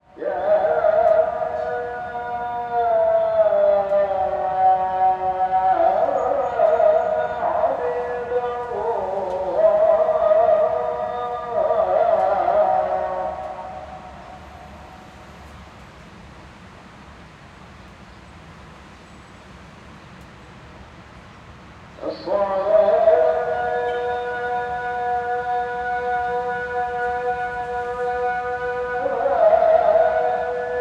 Istanbul, Call to prayers, Muezzin priesching, Taksim Square, City ambience; Sound Ex Machina; SOUNDS OF ISTANBUL